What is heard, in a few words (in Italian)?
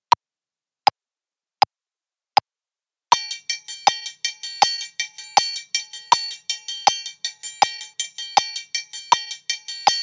triangolo